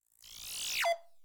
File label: terminal